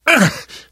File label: hurt minor, survivor; voice; source engine; left 4 dead 2; namvet